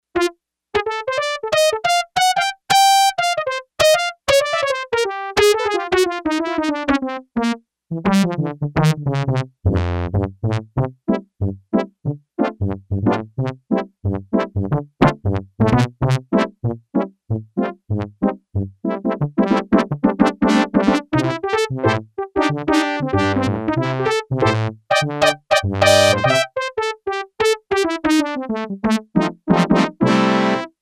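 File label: Trumpet, Yamaha TX802; Factory Patches; Voices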